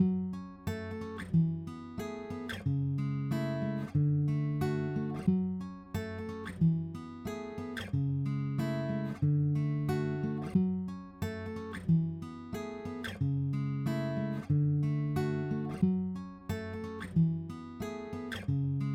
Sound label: Fmin 182bpm Acoustic Guitar Outro